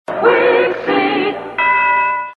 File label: bell; WIXY